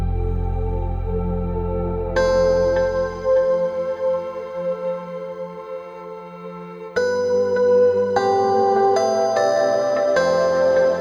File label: Ambient Theme Seamless Loop; Mirror's Edge Catalyst (2016); PS4 Pre-Order System Menu Theme